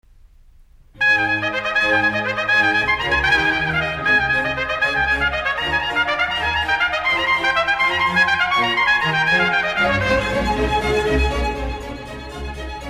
Concerto for Trumpet in Ab; Vivaldi; Trumpet Concerti

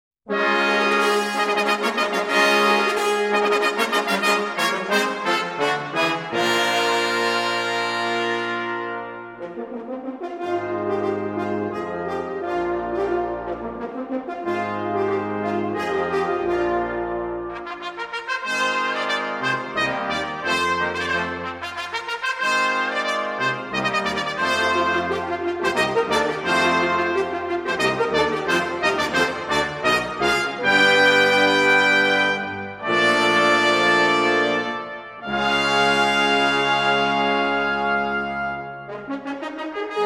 Fanfare, Brass Ensemble; La Peri

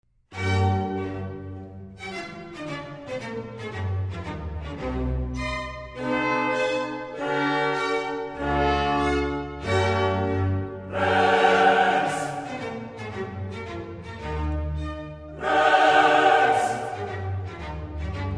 Requiem - Sequence - Rex tremendae; Boston Baroque; Mozart Requiem

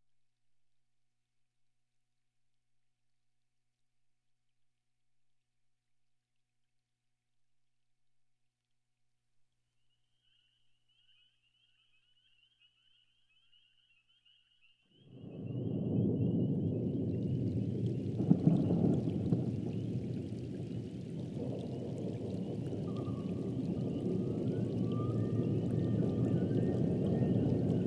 Thunderstorm

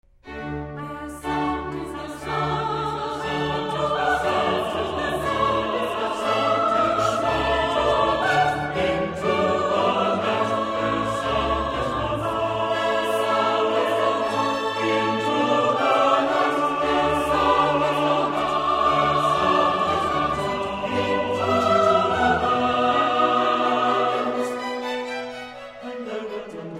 Their sound is gone out - Chorus; Boston Baroque; Handel Messiah

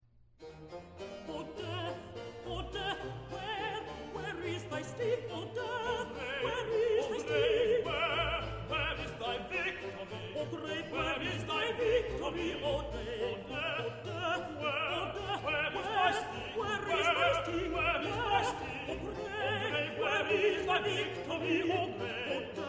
Toronto Symphony Orchestra; Messiah - Handel

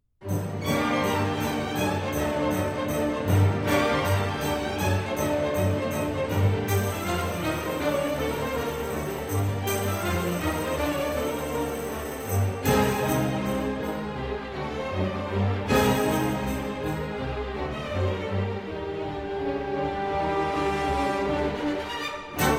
La Danza, Solo Trumpet; Doc Severinsen; Rossini; Trumpet Spectacular

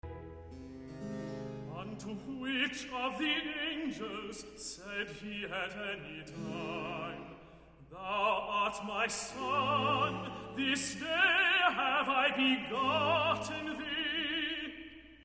Unto which of the angels - Tenor Recitative; Boston Baroque; Handel Messiah

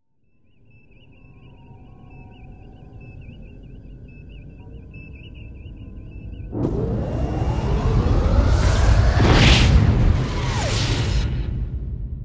Warp Drive

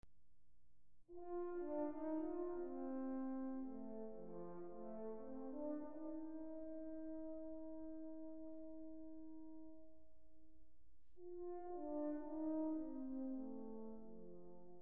Fanfare, Brass Ensembles; Grainger; The Duke of Marlborough Fanfare

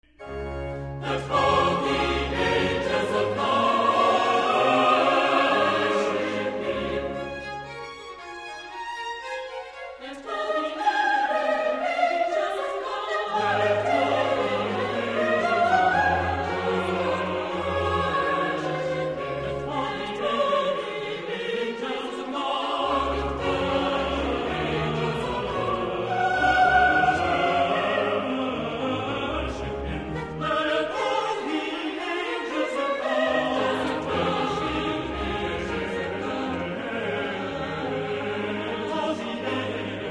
Let all the angels of God worship Him - Chorus; Boston Baroque; Handel Messiah

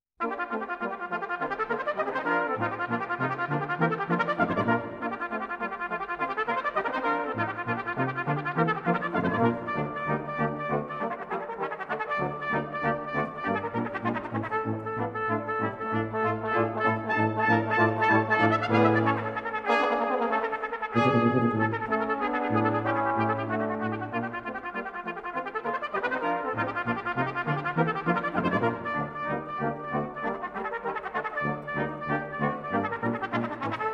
Tarantella, Brass Ensemble

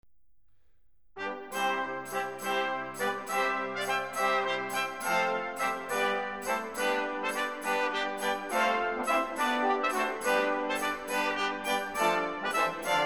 Corranto Battle, Brass Ensembles; Bull

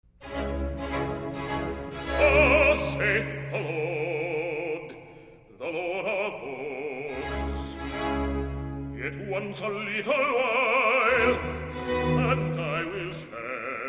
Bass Recitative, Boston Baroque, Handel Messiah; Part I - Thus saith the Lord

